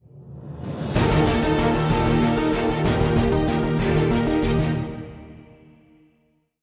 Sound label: 190bpm; Legends of Pegasus; windows gamerip 2012